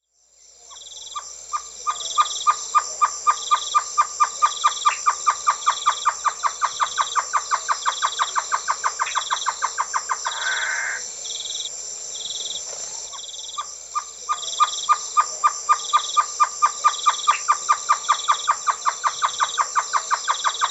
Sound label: Great Antshrike (Taraba major), Peru; Birds of the Amazon; S. America